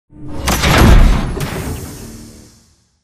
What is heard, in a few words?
embark casual above left end; Titanfall 2